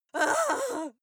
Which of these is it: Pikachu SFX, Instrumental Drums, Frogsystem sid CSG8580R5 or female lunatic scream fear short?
female lunatic scream fear short